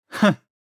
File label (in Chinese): 呵！-男